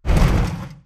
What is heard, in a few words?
bullet explosive titan shudder; Titanfall 2